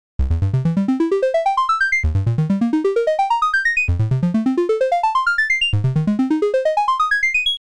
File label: osc tri